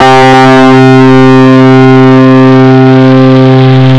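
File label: dist C, gtr, guitar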